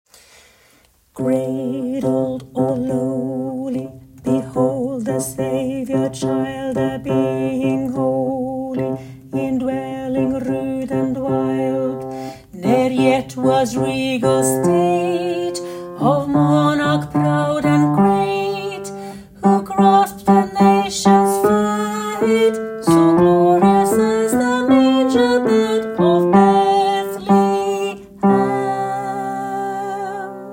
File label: Bass; Bethlehem